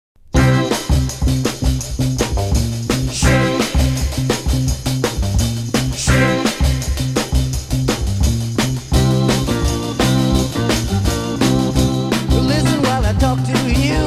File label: Quartet